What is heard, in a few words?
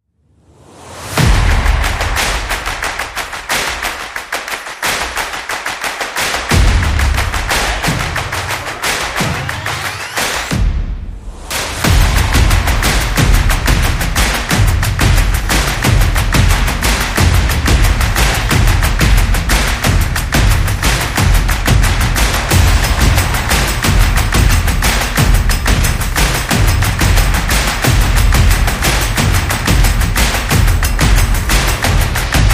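Athlete, sport and action music; underground vibes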